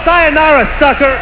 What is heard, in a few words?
disco